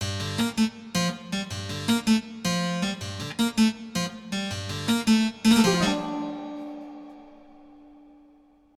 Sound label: forest bgm; chicory a colorful tale; ps4